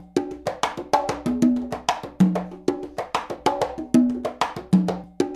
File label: LOOP, Percussion, FUNK; InVision Interactive; Percussion Slam